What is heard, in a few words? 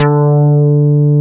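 POCKET BASS D4; POCKET BS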